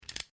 KNIFE HOBBY CLICK EXTEND; Various knives; Jangling Sound Effects; Jangling (Sound Effects:Objects)

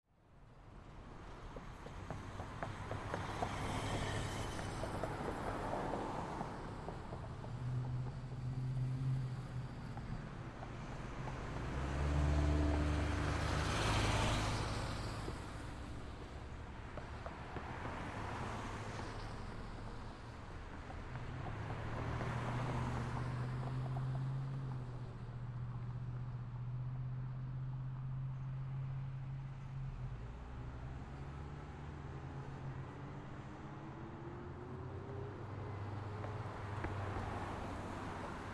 Traffic Street Intersection; Traffic And Car; Ambience; Traffic and Cars; Blastwave

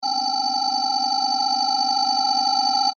Scanner Beam Ray, Scifi

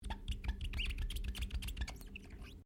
GLASS SQUEAK RUBBER SEQUENCE; Material components for constructing more complex sounds

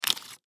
Glow Stick Remove, Glow Stick Remove From Package; Glow Stick Remove From Package Halloween; Holiday Sound Effects; Blastwave; Foley

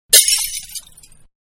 Glass Pane Broken; Glass Pane-Being Broken; Glass (Sound Effects:Materials)

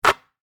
Basketball Net, Sports, Swish; Basketball (Sound Effects:Sports); BlastWave

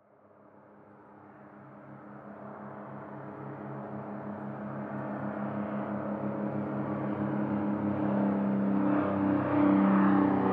Plane Propeller Plane Fly By Overhead; Airplane

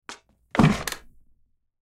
Chair Wood Sit, Chair Sit Down; Chair Sound Effects; FOLEY

